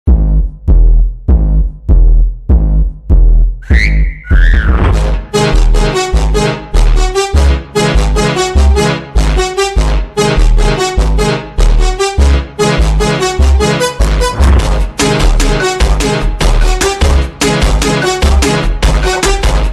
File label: bounce funk, slowed reverb